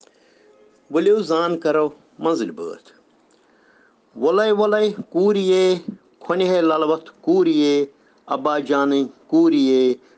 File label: Children Rhyms in Kashmiri